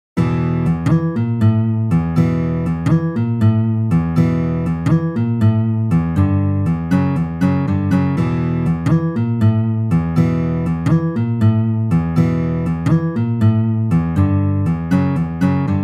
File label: riff